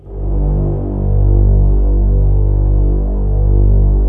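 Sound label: violins, low violins; Q Up Arts - Denny Jaeger Master Violin Library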